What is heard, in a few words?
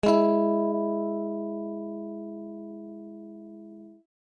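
string A tone G sharp 3, elec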